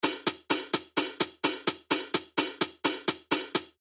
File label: 128 BPM; Alumni